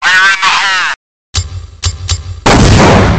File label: bomb sms ringtone